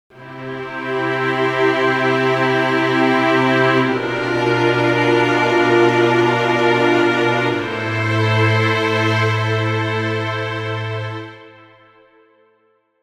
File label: raised7; altered chords; altered vii